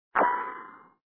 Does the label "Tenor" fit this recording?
No